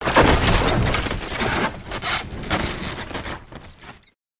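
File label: movement of pile of glass and metal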